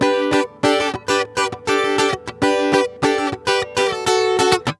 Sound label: GUITAR SAMBA